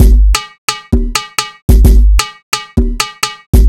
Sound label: beat